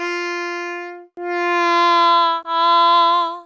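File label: sax soprano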